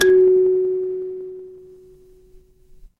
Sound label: instrument kalimba